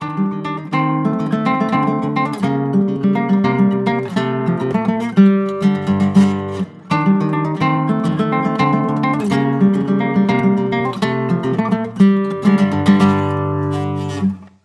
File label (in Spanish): falseta